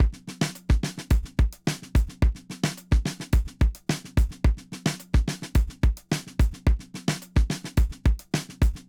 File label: Linear funk 108 bpm, drums loops; BASEMENT DRUMS VOL.1